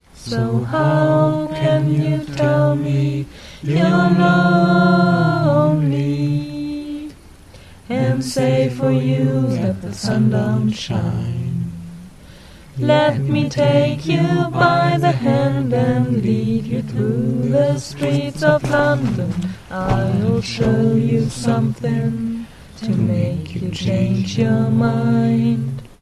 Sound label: streets; schweden 2002